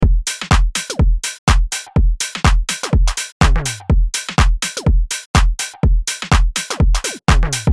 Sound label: House Deep House 124BPM